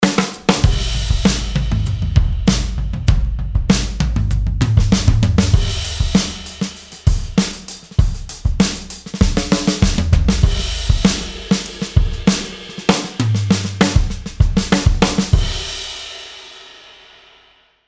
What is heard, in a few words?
brooklyn, drum kit designer; logic pro